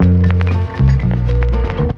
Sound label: keys, loops, phonky